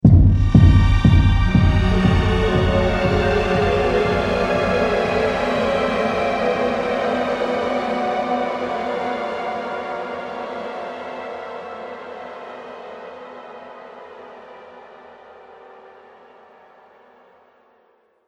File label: Trailer Hit Despair; Trailer Sound Effects; sound design